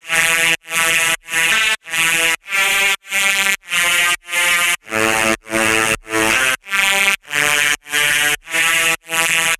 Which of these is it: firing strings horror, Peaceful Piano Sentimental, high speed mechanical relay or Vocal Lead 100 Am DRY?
Vocal Lead 100 Am DRY